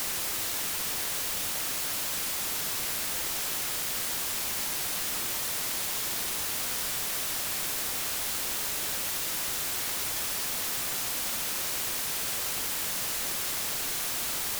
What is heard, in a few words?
white noise, 48kHz